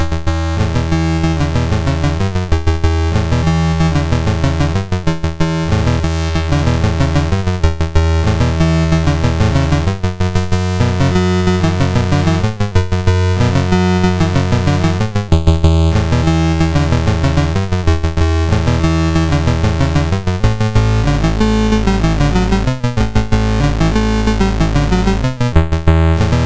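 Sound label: Magicians Ball, MOS 6581 SID chip music, games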